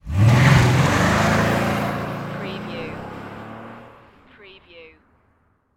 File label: Auto Drive Off Fast; sound effects bible